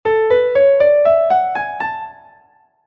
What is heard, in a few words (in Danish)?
A dur skala